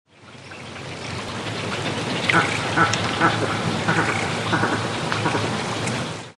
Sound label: agami heron